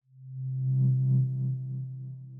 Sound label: UI Respawn